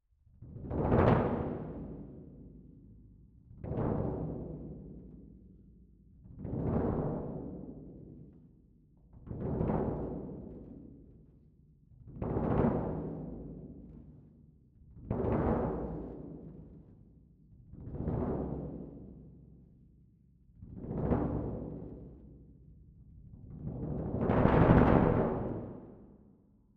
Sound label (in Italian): Cassa Main R, piano